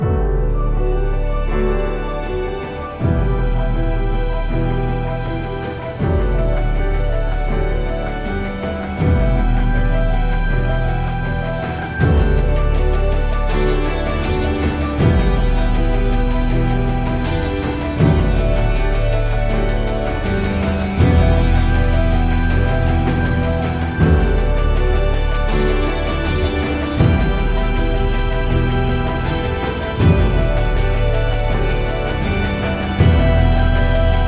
Alt Percussion

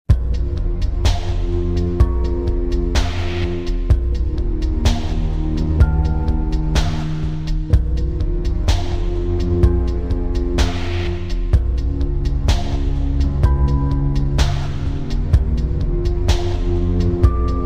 eerie evening

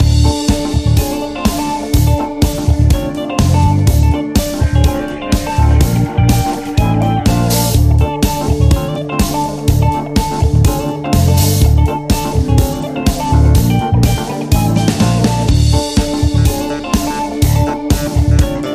Funky Party Music

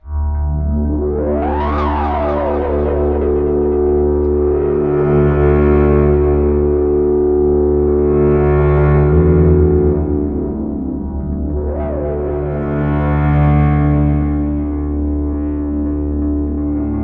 cello works

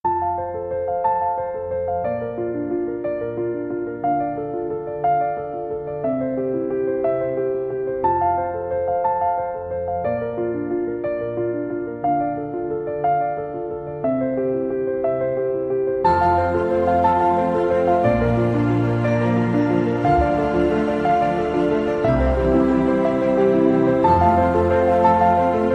Inspirational

